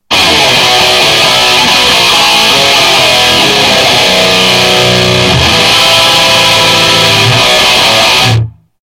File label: Rock Riff